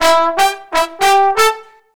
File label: BRASS LICKS, Disco Fever